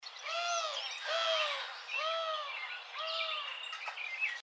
Eurasian Collared Dove

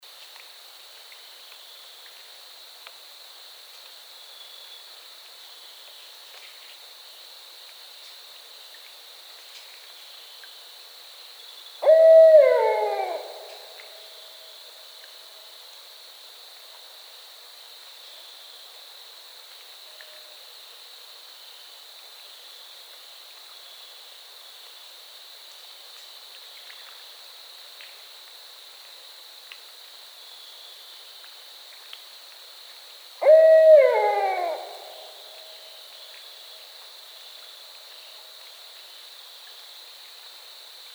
Barred Owl

